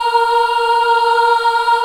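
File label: A#2 child ah left, Choir; USB Soundscan vol.28; Acoustic & Synth; AKAI; CHILD AHF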